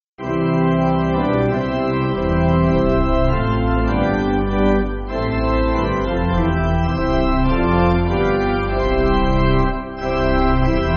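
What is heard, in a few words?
Pipe Organ, church backing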